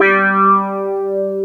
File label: HONNER G3, Keyboards Old School; USB Soundscan vol.09; AKAI